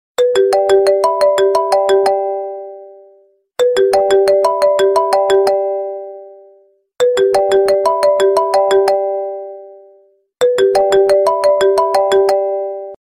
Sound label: Iphone 4s Loud; ringtone